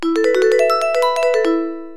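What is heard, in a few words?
wind chimes; AWE64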